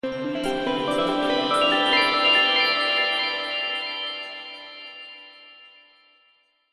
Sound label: fantasy magical musical glisando; zapsplat